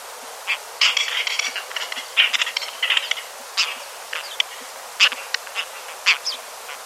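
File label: White-tailed Tropicbird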